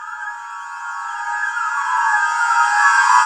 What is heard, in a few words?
rise in; Reverse Riser 1: Spooky reversed crescendo sound for techno or suspense